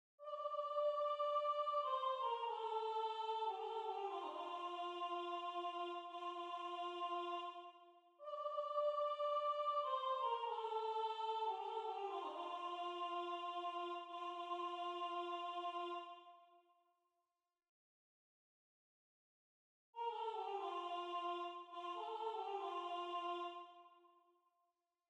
na nda soprano

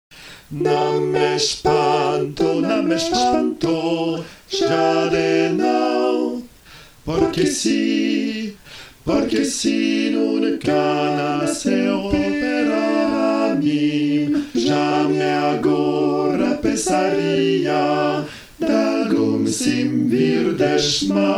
nam m espanto tutti

